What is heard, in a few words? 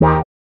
chords